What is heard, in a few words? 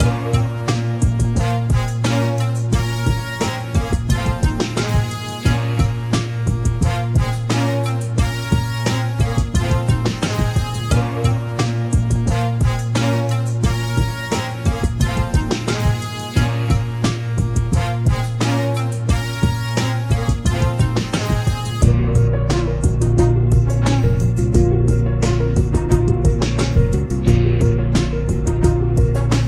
free royalty free instrumentals